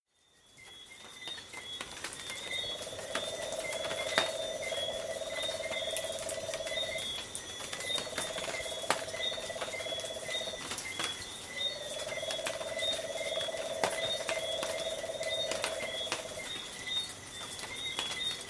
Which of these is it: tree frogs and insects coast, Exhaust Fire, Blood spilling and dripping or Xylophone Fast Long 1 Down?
tree frogs and insects coast